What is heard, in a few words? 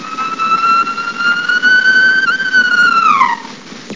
dolphin; spectrogram